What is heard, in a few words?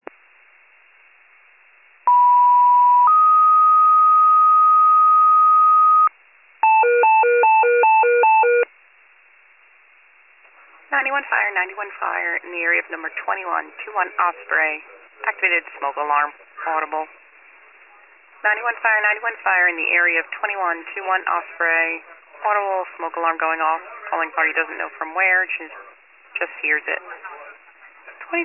FIRE pager alert